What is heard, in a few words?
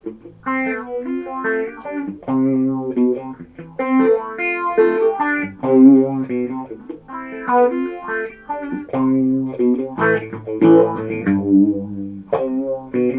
MXR 90